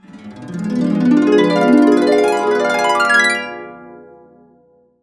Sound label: D DIM7 CA UP, CASCADE UP, Complete Harps; USB Soundscan vol.62; AKAI